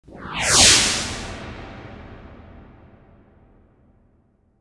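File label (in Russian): Вжух с эхом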